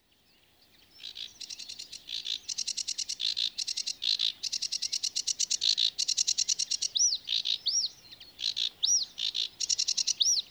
Rietzanger; vogel

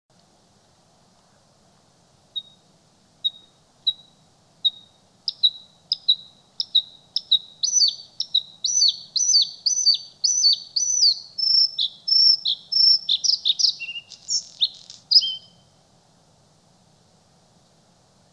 Blauwborst, vogel

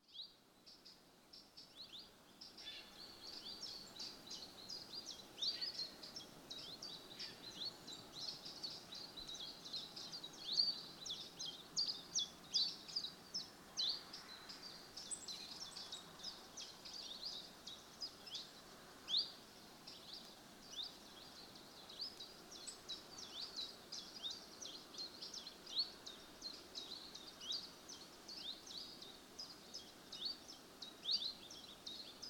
Tjiftjaf, vogelgeluiden

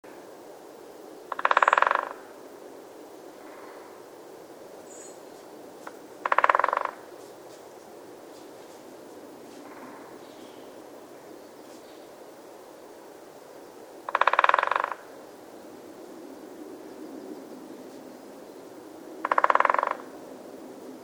Grote Bonte roffel; Zang en andere geluiden van in Meijendel broedende soorten; VWG Meijendel CD

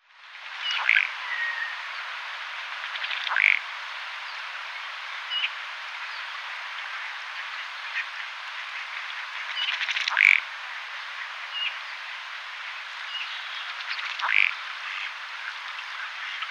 rosse stekelstaart, vogelgeluiden; hdykstra

